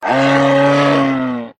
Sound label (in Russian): krik losya korotkij zvuk